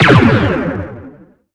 Torpedo

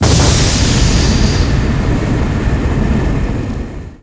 AI Burst, Plasma; SpecialFX; NanoFXv2

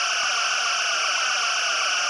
phaser; Weapons; Galaxy DS9

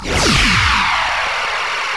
Reman Disruptor

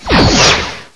torpedo, Weapons; borg

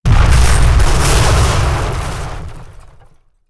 Collision, Explosion; NanoFXv2; ExplosionFX; ExpCollision

